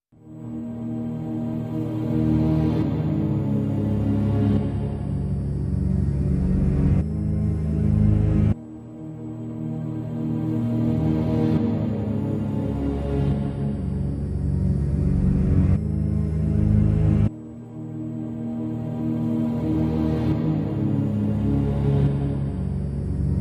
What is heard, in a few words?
Min Ambient